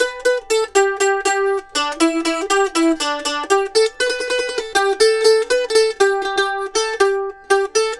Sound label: Mandolin Lead; Continental Drift Aftershocks